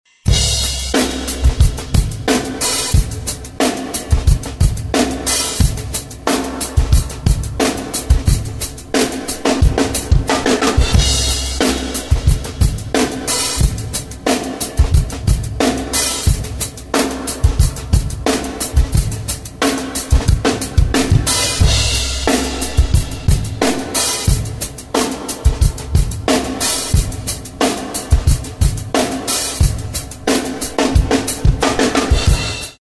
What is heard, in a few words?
slap bass drum jam track